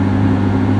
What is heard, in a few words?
Low Lp, Engines; SuperBike2001